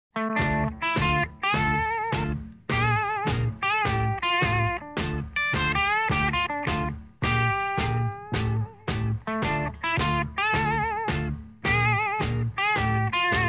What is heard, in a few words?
Instrumental